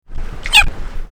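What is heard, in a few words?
Rana catesbeiana chirp juvenile, frogs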